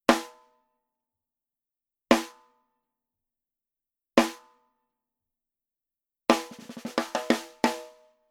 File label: SNARE Dry Rim Shot; GeneraHD